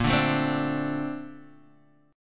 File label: A sharp Major 9th, Guitar, DADGAD